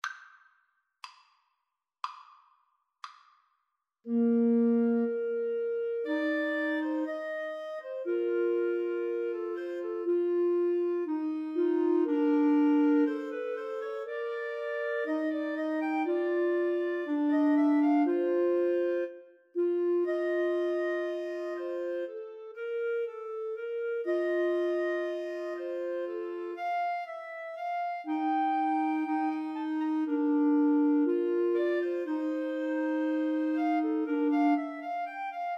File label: Clarinet Trio